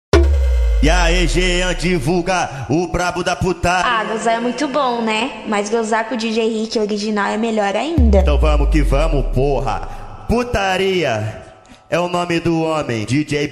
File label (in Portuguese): scrach automotivo; bob descontrolada